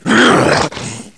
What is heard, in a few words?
death; heavy